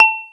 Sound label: Video Record, ui; LG